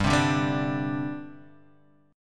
G Suspended 4th, Guitar, Half Step Down

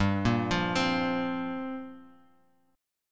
G Minor 11th, Bass Standard; Gm11 arpeggiated; JGuitar

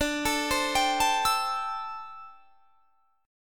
D 9th Suspended 4th D down; D9sus4; arpeggiated

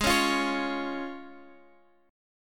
F Minor Sharp 5th, Ab

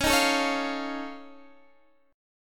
F Augmented 7th C sharp, chord

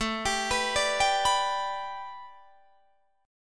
A 7th Suspended 2nd Suspended 4th Guitar Drop D; A7sus2sus4 arpeggiated

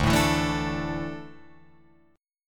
D Suspended 2nd D down Guitar Open F; strummed

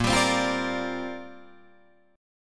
A sharp 6th Add 9th, Guitar Drop D

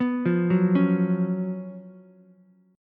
F sharp Major 7th Suspended 4th, Ukulele; F#M7sus4 arpeggiated

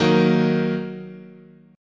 Eb Minor, chord

